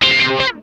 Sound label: RASP, Funk Guitar; 90 s Sample CDs; Zero G